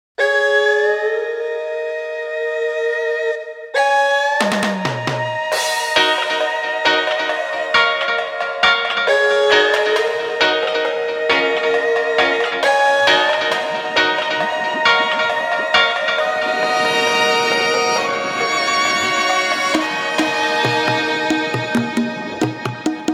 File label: dub